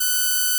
Inharmonicity F#6

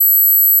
Gauss Filt B7

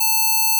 Odd Increase A5

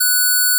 Odd Increase F#6

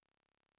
PeakSlope C#1

